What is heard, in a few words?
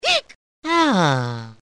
eek sigh; mario